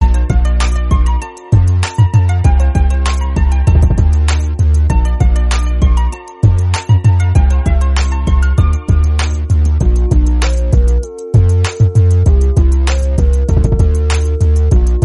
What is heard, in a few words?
instrumental ringtone